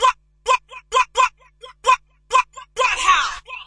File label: 115 stutter vocal; VOCAL OR VOXY LOOPS